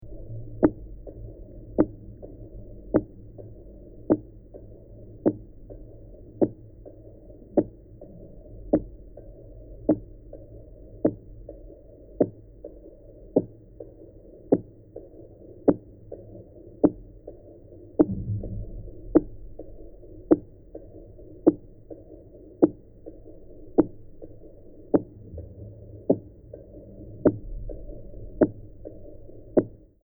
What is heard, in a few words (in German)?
unterwasser tonaufnahme bodensee side scan sonar system; klaus osterwald